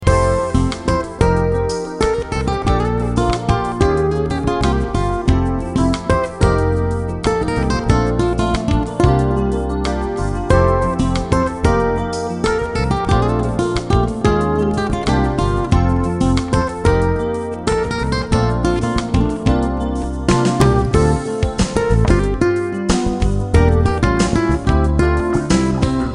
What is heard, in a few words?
Guitar Pop Jazz